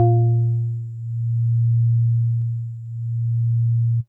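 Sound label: House Organ DX100 A#0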